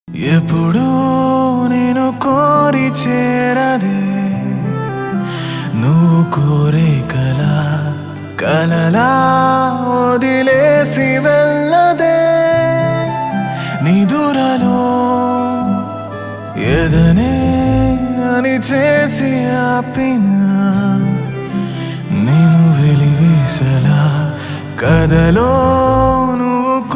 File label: sad song emotional; cenima vadu